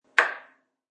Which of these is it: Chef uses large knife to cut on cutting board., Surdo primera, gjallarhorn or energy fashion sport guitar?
Chef uses large knife to cut on cutting board.